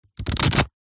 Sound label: household book crossword thin pages flick through